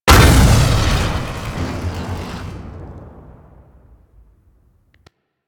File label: Staff Slam, melee slam